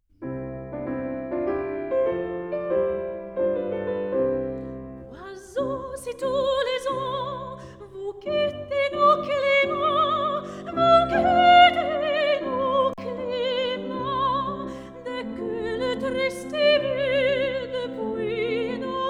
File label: song for voice & piano; Mozart; Oiseaux, si tous les ans